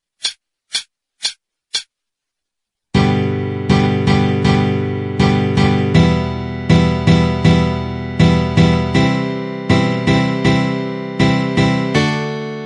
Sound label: Strumming 80